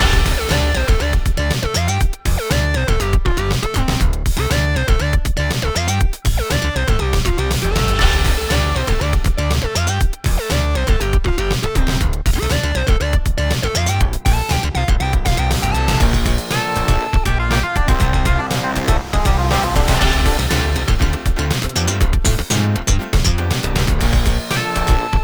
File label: Main Menu Loop - 120 bpm; fashion police squad beta